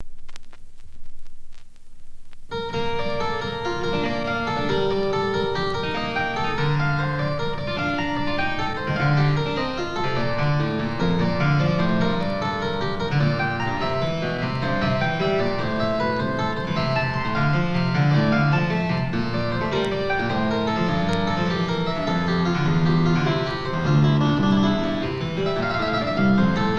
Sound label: Partita No.1 In B Flat Major, Courante